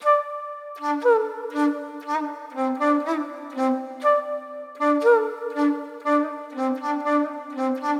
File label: Flute Arabica Flute 120BPM Dmin; 99Sounds x Monosounds; Instrument Loops